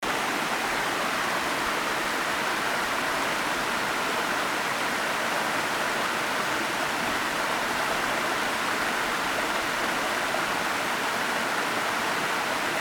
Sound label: waterfall in the mountains, nature sound